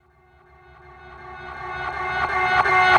Trumpet Echo Reversed

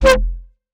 Bass F, Bass Synth; 99Sounds; Monosounds; Music Loops; Instrument Oneshots

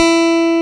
E3, GUITAR; AKAI S-Series CD-ROM Sound Library VOL-8

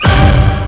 Orch Stab Classic; Urban Shakedown

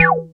BASS, Tekno Industrial; East West

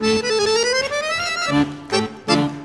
ACCORD HITS; Best Service; World Colours

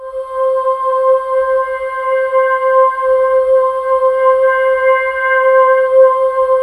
DARK VOX, JV-1080

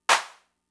Clap, Fricke MFB-522, Drum Machines

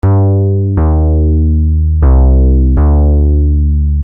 Syn Bass 120 Gm, Techno Synth Loops; SynBs; 120bpm; Sonic Foundry (Sony Creative Software); Signals I

